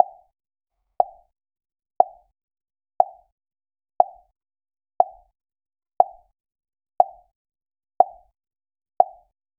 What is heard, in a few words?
pop